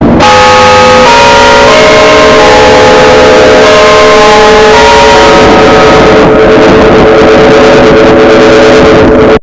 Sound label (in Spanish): reloj saturado; pachuca